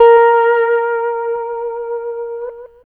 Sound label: Lap Steel, Trip-Hop Loops